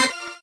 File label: normal hit normal